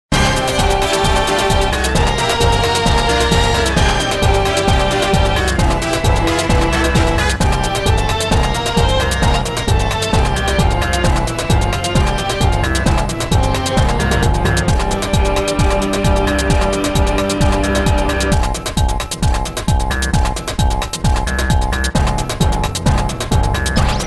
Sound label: Battle; Magnus von Grapple; Paper Mario The Thousand-Year Door